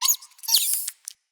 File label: Misc Squeaks, Animals, Vocals; Floppet